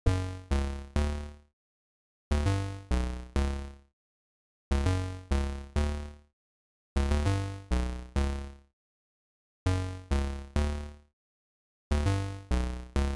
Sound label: Bass 808s Clean Before